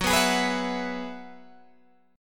Gb 7th Suspended 2nd Suspended 4th Gb down Guitar Open G